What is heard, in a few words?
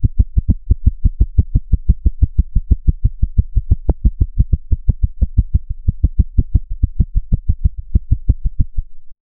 heartbeat dhak dhak